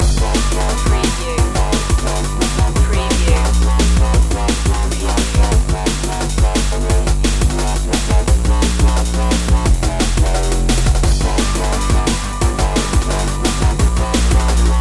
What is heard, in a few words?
new dnb dream loop